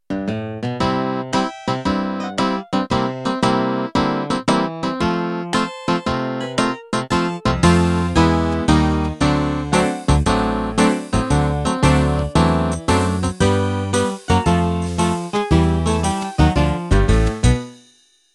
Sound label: Country Ballad Intro